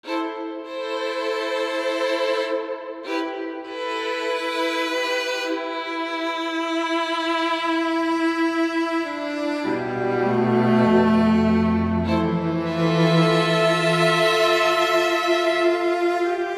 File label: Heart of the Strings; orchestral + piano